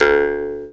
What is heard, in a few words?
D JAW, Primitive Instruments